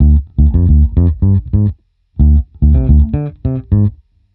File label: Bassline 110 D, hiphop, 110bpm, Bass; musicradar